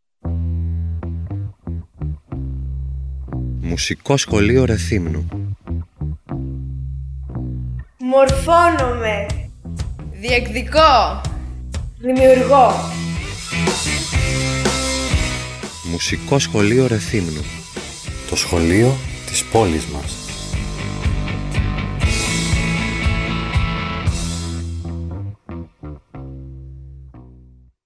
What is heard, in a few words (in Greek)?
spot mousik